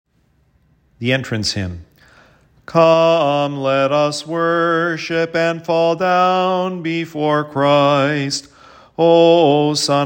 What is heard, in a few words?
entrance hymn